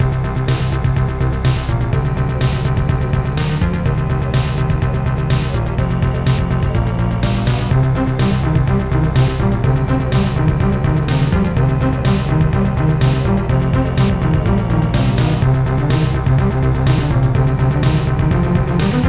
fast violins; MED

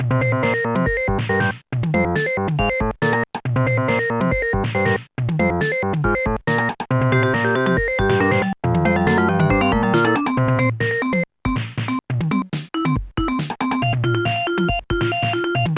good groove; Instant Music

